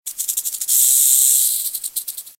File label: snake; snakes